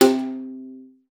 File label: C3, VIOLA PIZZ; Xsample VOL-5; 90 s Sample CDs